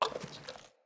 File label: Camel Idle Chew; Khaban Camel Vendor; Vocalizations